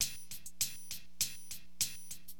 DM LOOP, HipHop, Drums; LiveAct Pool; AcidJazz Funk

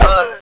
alien hit; Army Men TiS

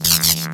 welder; sfx welder

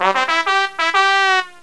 Charge!; Jim Heid’s Sounds

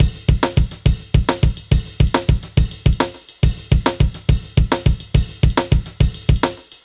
Rock, Drums; ACID MUSIC

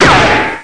Ricochet; Apeiron

